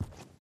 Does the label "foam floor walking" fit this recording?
Yes